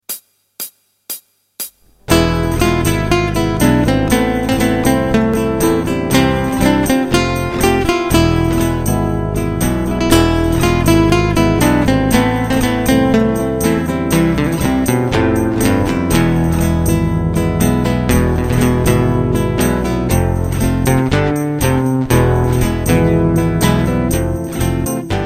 Spanish Rhythms, guitar

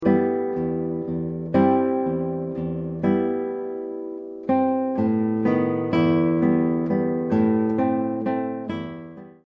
double o waltz sabor

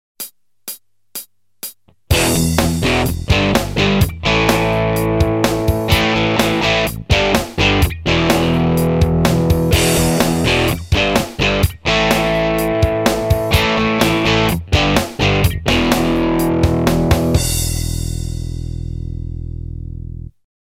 Rock Riff, guitar

